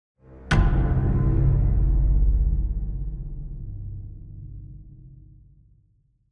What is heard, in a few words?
Hit Subtle Stylish True Organic; Trailer; LYN115 Trailer Production Elements - Hit 11